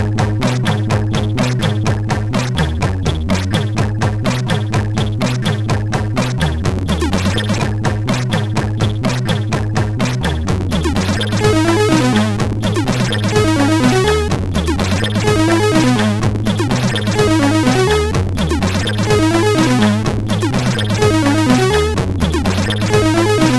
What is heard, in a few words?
tune, sid, MOS6581R4